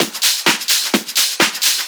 Discohouse Loop 128 BPM

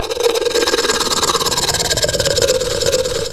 BERIMBAU; OMI - Universe of Sounds

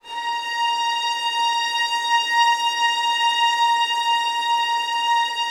Violins, A#2, Classic Orchestra

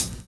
VINYL HH, Drums Dance & Groove; USB Soundscan vol.11; AKAI; VINYLKIT

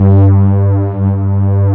PA, ANA PAD

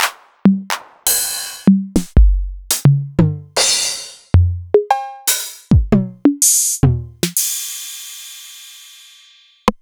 Novation Drumstation

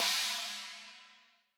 Crash, 140bpm, Grime; Zero-G Sound Sense Grime Scene; Construction Kits; Astoria

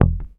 HOF X NOTE, Hofner bass; Sampleheads; Will Lee Bass Library; HOFNER SUS

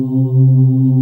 VOICE JD800; Best Service Gigapack 1

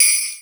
HANDBELLS; Crypton - DrumBase5000; T1 DRUMS

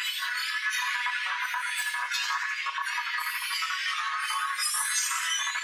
Tonal Re Draw, sparse soundscape

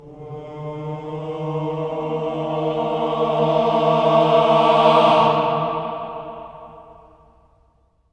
CLUSTER, Voices

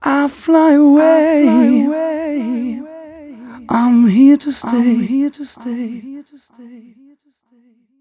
m 129 mel e, Vocals

